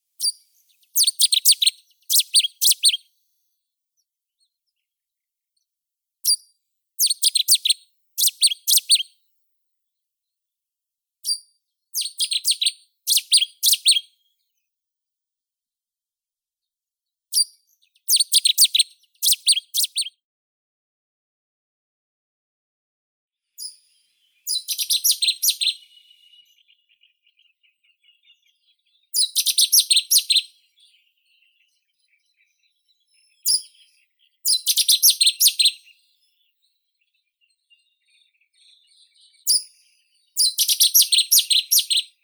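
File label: Cetti's warbler cettia cetti; shirokohvostka solovinaya; zvuki prirodi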